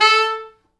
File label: A3, Pop Brass